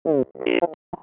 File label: rock; space kitty